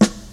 fat soul steel snare drum a key, Royality free snare drum sound tuned to the A note.; 90s